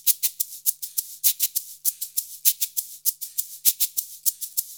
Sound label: Modern Percussion Loops; Soundscan vol.56; AKAI